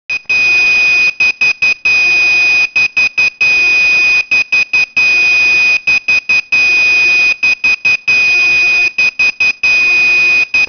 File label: Beepcode Compass Error